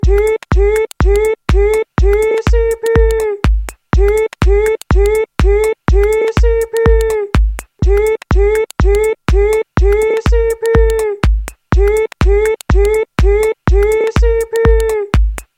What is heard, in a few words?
tcp ringtone